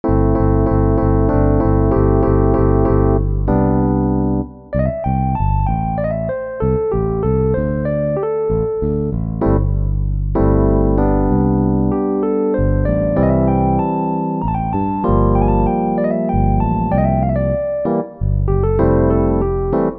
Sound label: epiano; Yamaha; p-125; fifth demo song